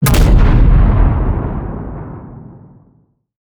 skill clean big boom

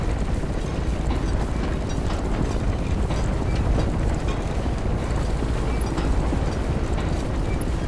float city loop

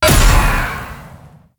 fire sword stab

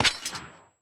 swo mvmt

